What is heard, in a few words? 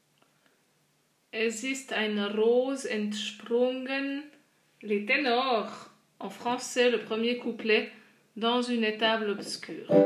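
Es ist Ein Ros Entsprungen ténor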